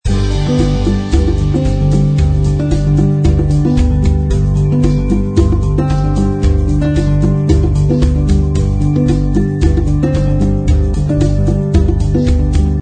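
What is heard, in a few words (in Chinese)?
Vamp, 这首以原声吉他和钢琴为特色的歌曲充满了感伤、圆润和温暖的光芒。; 音乐素材 夏日温馨套装 Warm Summer Kit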